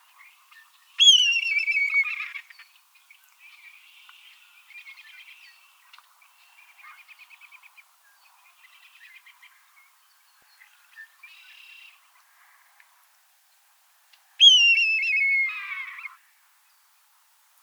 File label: Red tailed Hawk Buteo jamaicensis